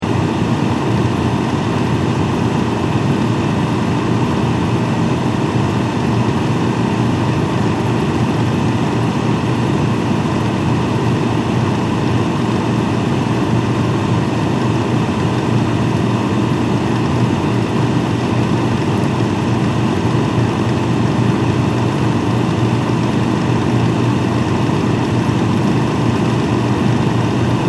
Household Refrigerator Run Interior Perspective Loop; Kitchen